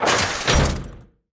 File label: Lobby Elevator Door Close